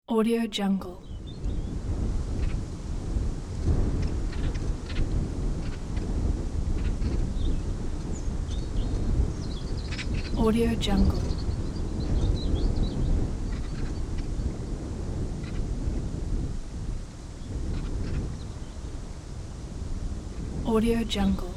night forest wind

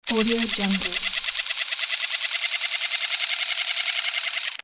electric toothbrush reversed

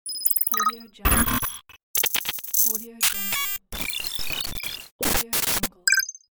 hud pack

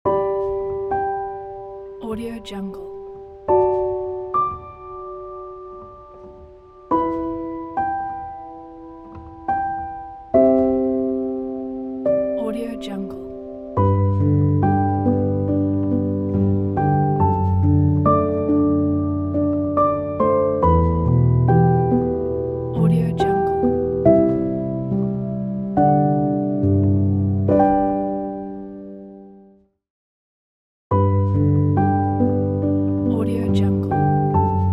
sentimental piano ident

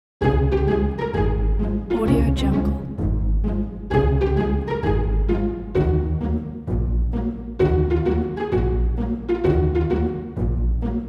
funny cartoon

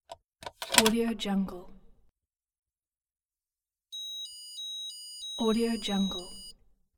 card swipe and denied

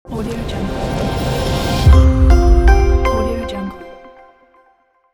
lawyer intro logo

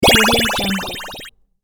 games retro magic transform

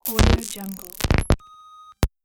glitch; bogdansfx

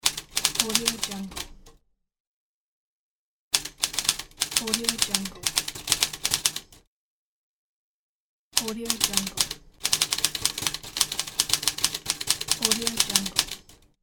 typewriter keys; wormwoodmusic

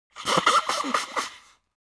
human nose blow wiggly

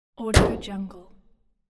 motor hood closing

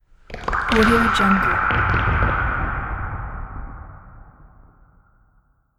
alien infection

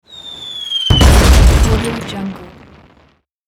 whistling bomb

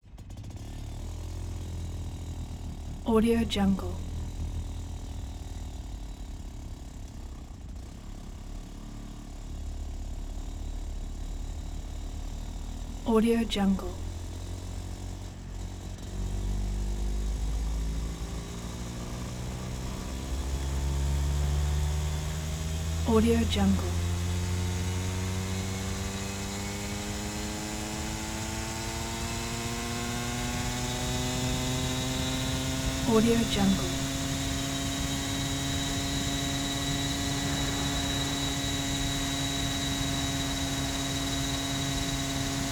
go kart driving constant start

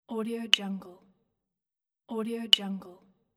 clave hits

